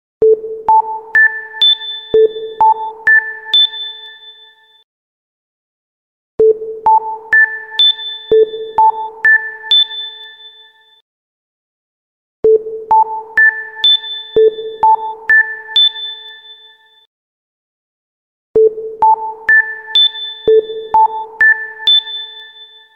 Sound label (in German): Sinus